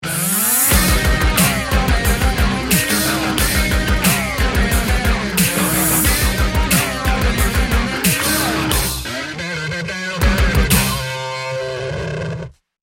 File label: Metal